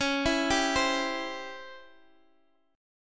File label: C sharp Minor Major 7th Flat 5th, Guitar, Half Step Down